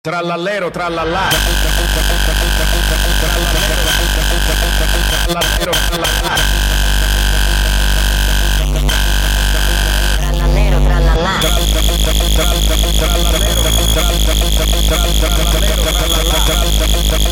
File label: uptempo